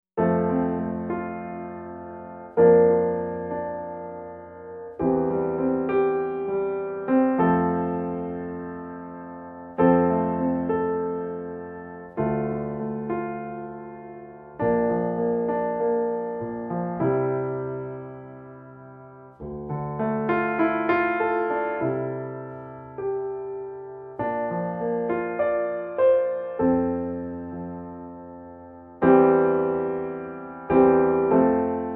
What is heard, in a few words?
Classical New Age Piano Music